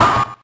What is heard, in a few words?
mech hurt; hero-mark-2